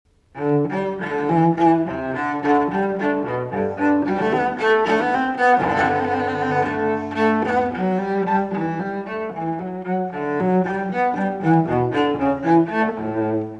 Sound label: Cello Suite in G Major, Gigue; Bach, JS; No. 1; BWV 1007 VI.